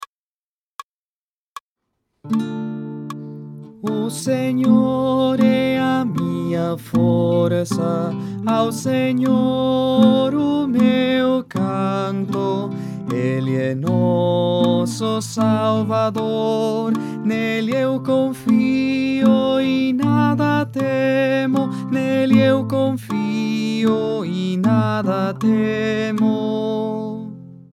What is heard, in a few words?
Tenor